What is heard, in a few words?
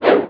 SWING; outlaws